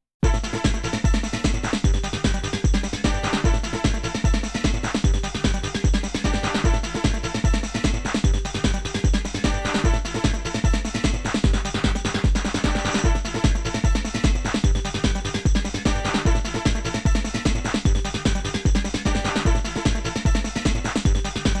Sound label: Continue GAME OVER; Capcom G.S.M. 7; Tenchi wo Kurau II The Battle of Red Cliff